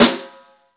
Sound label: SNR, Snare; Club-50 - Foundations Roland; Ext.Snare 2